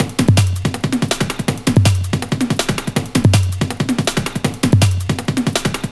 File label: Loops, breaks; M8